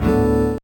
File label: Fishing - Good